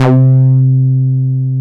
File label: TB 303